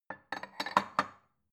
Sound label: bowl shelve soft